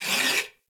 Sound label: Moa Leg Movement; Warframe; Footsteps